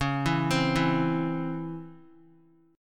Db Minor Major 7th Db Guitar; DbmM7 arpeggiated; JGuitar

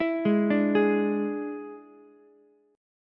D Suspended 2nd Flat 5th down Ukulele; arpeggiated

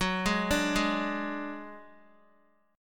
F sharp Suspended 2nd Sharp 5th, Guitar; arpeggiated; JGuitar

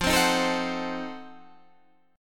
F 7th Flat 9th F

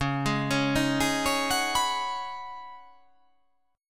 C sharp 9th Suspended 4th C sharp down, chord

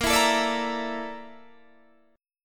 A sharp Major 7th Flat 5th A sharp Guitar Open D

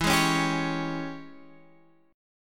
G sharp Major 7th Suspended 4th Sharp 5th, E

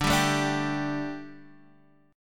A 7th Suspended 4th D

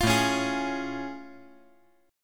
A Augmented Major 7th A

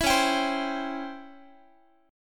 Db Minor Major 7th Flat 5th Db down Guitar; DbmM7b5 strummed; JGuitar

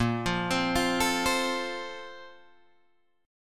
A sharp Major 7th Suspended 2nd, Guitar, Drop D

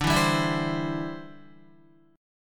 D 7th Suspended 2nd Suspended 4th D, Guitar; D7sus2sus4; strummed

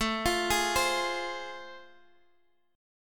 A Minor Major 7th Sharp 5th A, Guitar, Standard; AmM7#5 arpeggiated; x,x,7,10,9,8

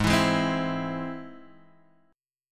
G sharp Augmented, Guitar, Drop D; 6,7,6,5,5,x

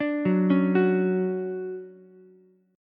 D-Major 7th, D, Ukulele; arpeggiated; JGuitar

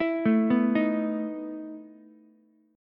E Major 7th Suspended 4th, Ukulele; arpeggiated; JGuitar

